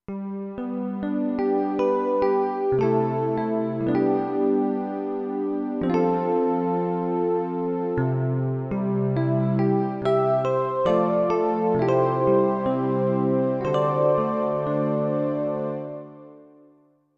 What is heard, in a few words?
Atmos Pad